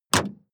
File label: sports car ext bonnet close